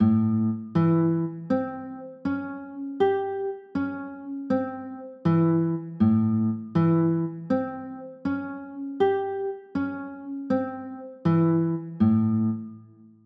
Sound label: a sharp 6 9, chords